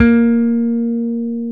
BS P BASS; Roland L-CD701; Jazz Bass

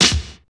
DRUM, INDUSTRIAL

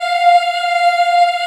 F4 CHILD, Choir; CHILD IHF

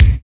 BD, BD Dance; Sound & Vision; Gigapack I CD 1

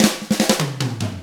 ROCK 6/8, Drums; ILIO; Double Platinum Drums 1

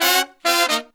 Phantom Horns, 132BPM; 90 s Sample CDs; Zero-G

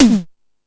Hip Hop, Drum Machines E-MU Pro-Cussion

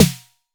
S D TIGT; Crypton - DrumBase5000

